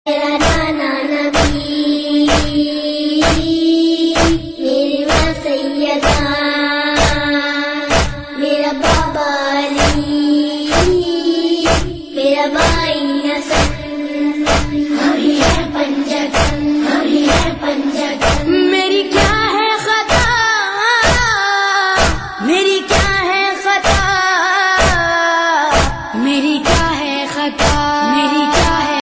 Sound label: noha moharram tune ringtone